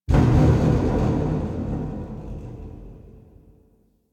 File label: Metal Rumbles